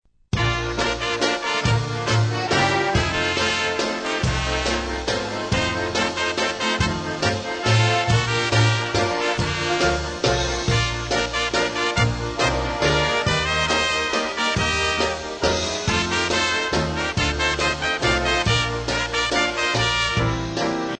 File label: WALTZ